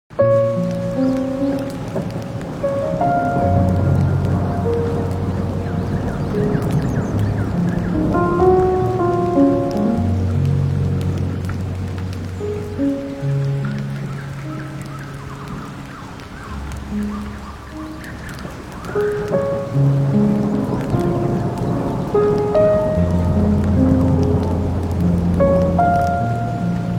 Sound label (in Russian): Shum Dozhdia, Shum Moria, Zvuk Vody; Zvuki Dlia Sna